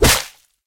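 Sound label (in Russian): выхлестнувший соперника удар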